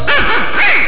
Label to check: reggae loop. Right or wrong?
Wrong